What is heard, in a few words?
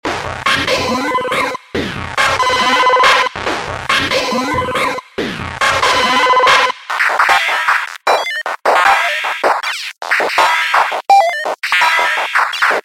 Retro Computers; PUREMAGNETIK